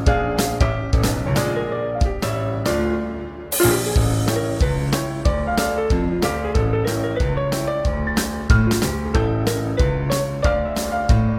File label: Piano Mix